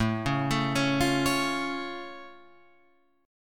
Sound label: A Augmented A down Guitar Open F; arpeggiated; JGuitar